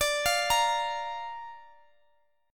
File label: D Minor Sharp 5th D down Guitar Open G